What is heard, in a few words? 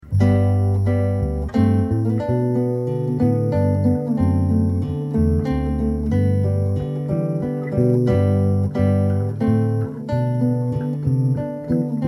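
Waltz Guitar Bass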